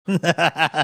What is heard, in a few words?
cackling